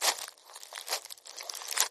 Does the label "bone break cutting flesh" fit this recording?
Yes